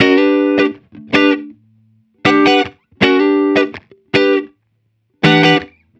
HipHop Guitar Dmaj; Digidesign; Pro Tools; Pro Tools Essential Loops

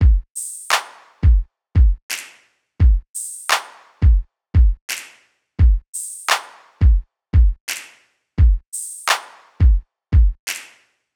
Hip Hop Beat, Drums; Drumbeats; Digidesign; Pro Tools; Pro Tools Essential Loops

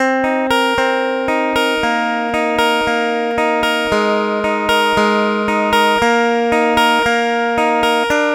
Pop Ac-Guitar Arp Cmaj; 115; Pop Rock; Acoustic Guitar; Pro Tools Essential Loops; Digidesign

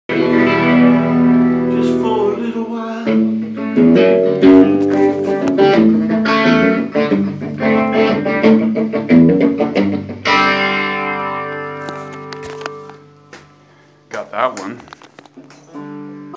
lil riff

